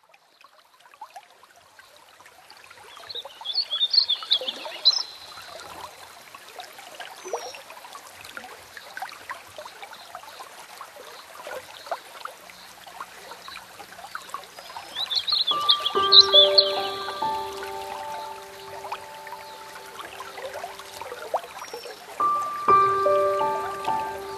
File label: Warbling Vireo, Piano Songbirds